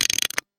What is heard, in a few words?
card shuffle; Cards & Board